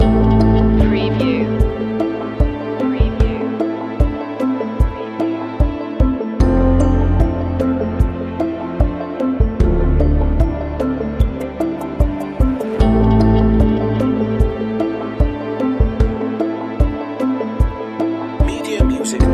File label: pieces falling into place loop